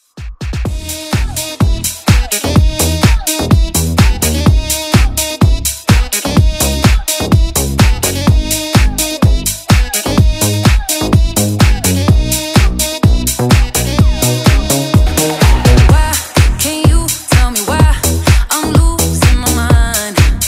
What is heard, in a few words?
Dance Redrum